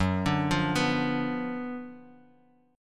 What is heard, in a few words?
F sharp Major 7th Suspended 4th Sharp 5th F sharp, Guitar Open D; 4,5,3,5,x,x